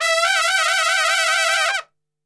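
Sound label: E trumpet shake; Best Service; Brass Super Section; TRP SHAKE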